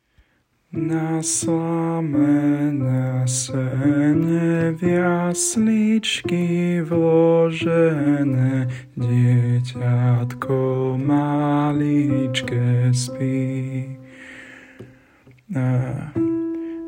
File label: alt, song